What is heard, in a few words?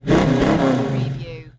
Mustang 68 Fastback Rev Twice Exhaust Perspective